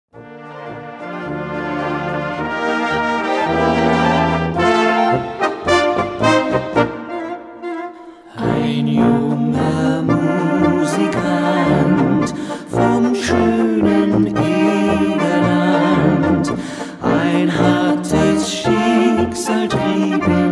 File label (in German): Blasmusik